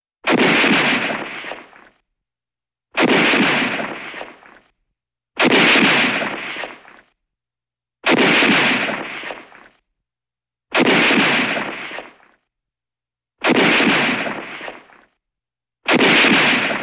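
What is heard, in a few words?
shooting from a German rifle of the world war; strelba iz nemeckoj vintovki vremen mirovoj vojni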